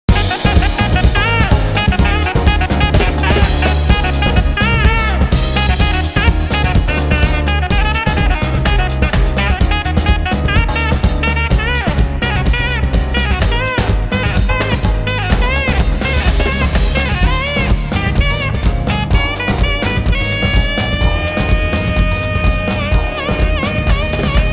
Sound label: morning drums